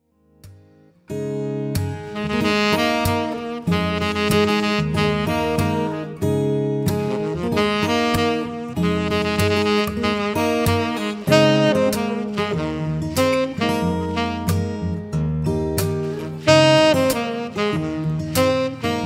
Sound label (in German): Saxophonist Empfang Lounge Hochzeit; Hannover